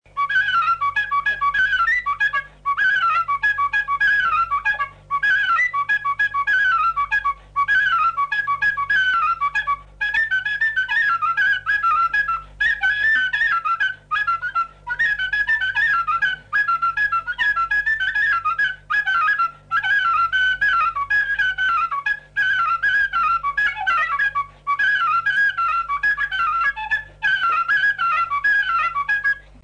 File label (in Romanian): Batuta II la fluier; jocuri si cantece batranesti; Vaslui